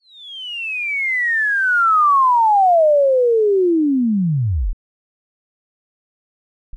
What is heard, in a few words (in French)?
siffle glis